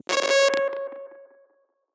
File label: Alert, Electronic; SFX